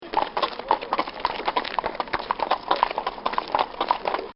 Horses Walking On Concrete; Animals; Bobby Cole

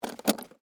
Case Pick Up Small, Foley, Case, Pick, Up, Small; Pick Ups (Sound Effects:Objects); BlastWave

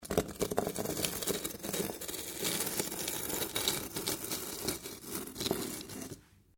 Stone On Stone Small Scrape; Material components for constructing more complex sounds; Scrapes

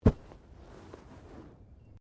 Body movement Fall Slide Light; Body movement foley to simulate falls and deaths; Wrestling And Struggling

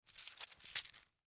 Coin Purse Pick Up; foley; Money Sound Effects; Objects; Blastwave

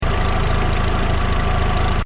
Engine: Tick Over Revs Loop, car; Cars; Vehicles; Stuart Duffield

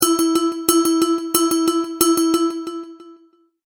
Multimedia Tone-Thinking/Processing

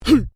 MALE VOCALIZATION HUH; Exclamations; Voice

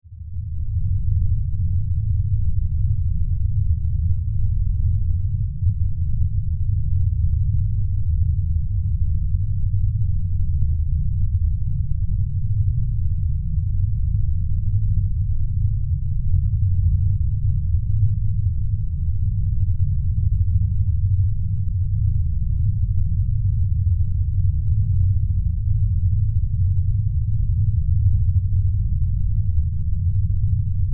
Horror Drone Demonic Low Rumble; Evil Sound Effects; Evil (Sound Effects:Horror)

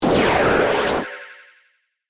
Sonar Hit Phase, Sonar, Ping, Space; Sci Fi Sound Effects; Sci-Fi (Sound Effects:Science Fiction); BlastWave

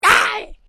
Created Creature Small Cry, Alien Sound Effects

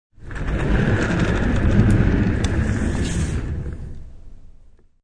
Rolling around in a chair with wheels; Objects

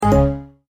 multimedia tone error; Tones; Sound Design

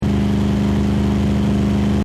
Engine: Medium Revs Loop, car; Cars; Vehicles; Stuart Duffield

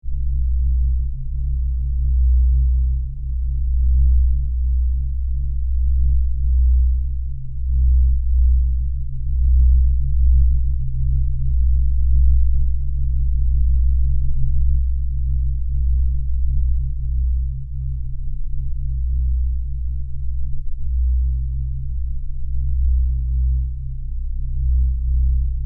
sound design drone dark; Sound Design - Dark Eerie Drone; Drones; Sound Design-Dark Drone 9; McKinney Sound

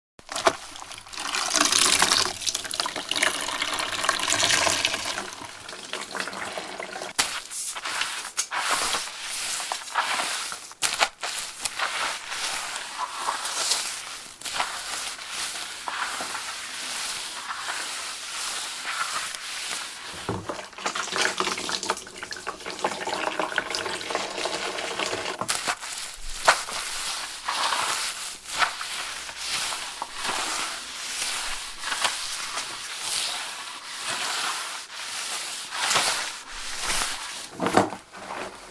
Mopping Floor, Mopping a tiled kitchen floor.; Cleaning (Sound Effects:Household); Mopping The Floor

